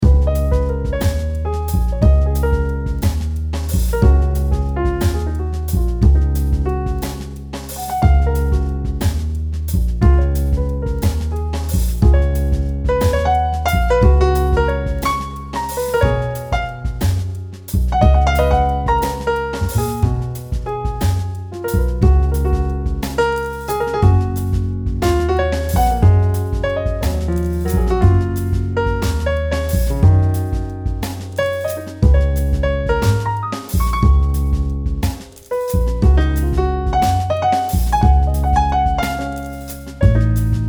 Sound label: Jazzy